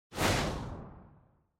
Camera Motion Short Stereo Pan; シーン・カメラモーション

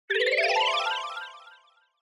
Scene Change Long Delay

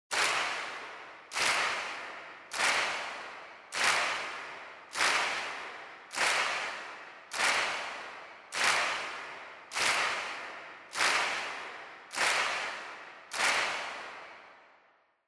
Handclap Mid

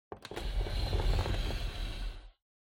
Air Purifier Move

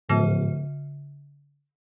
Onoma Negative Short; オノマトペ ネガティブ; 短

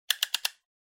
Cutter Click

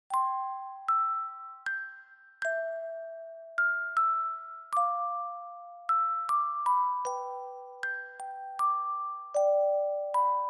Reverb Slow; bgm

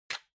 Match Close

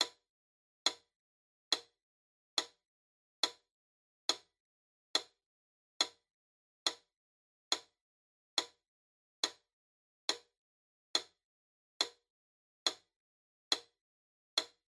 Metronome Click Loop Slow

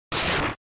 Fighting Attack

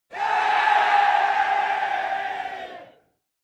Cheer Yay, Low Solo

